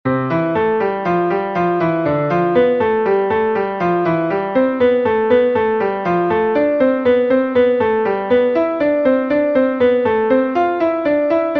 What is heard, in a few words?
Hand Warm-Up, Piano; Hanon